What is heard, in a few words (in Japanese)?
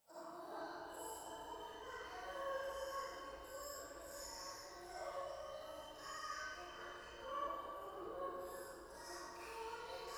夢の中 低音喘ぎ 山奥